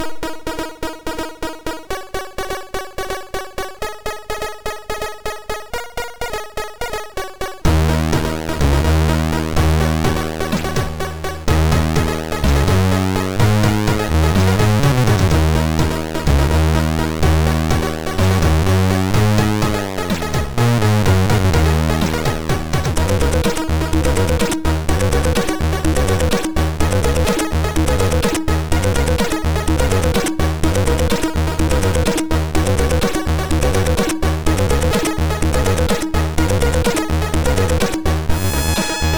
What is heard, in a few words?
Calm Intro, sid MOS6581R3